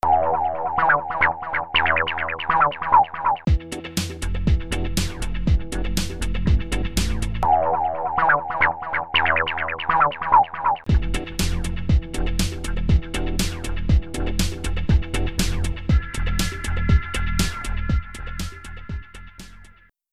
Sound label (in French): boucle intro